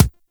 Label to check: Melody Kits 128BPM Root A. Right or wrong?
Wrong